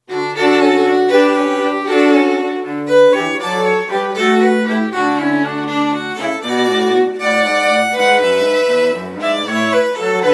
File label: trio gigue